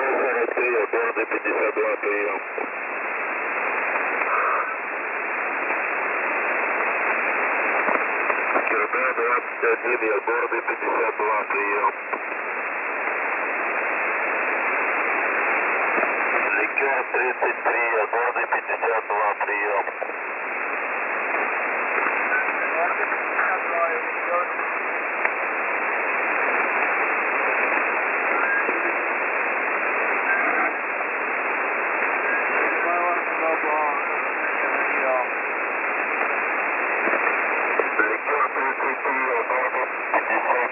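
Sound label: Russian Military 4088.0kHz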